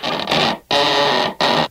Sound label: creak